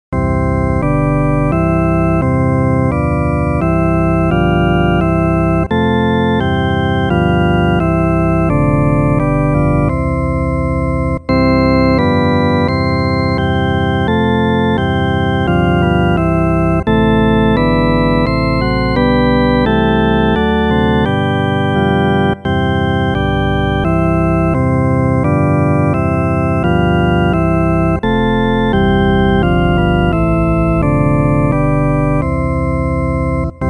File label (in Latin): solo org tantum ergo, organo